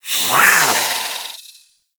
Catbrow Feral Vocal Attack Medium, Enemies; Warframe

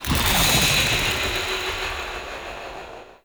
Fairy Sprint Thrust

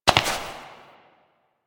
Step Rock A; Warframe; Koumei

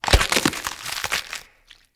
Pumpkin Destroy

